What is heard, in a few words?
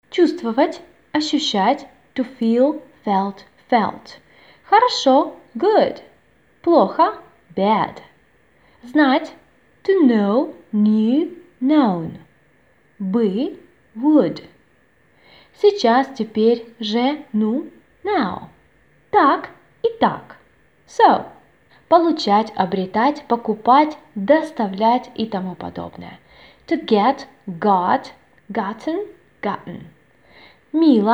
voc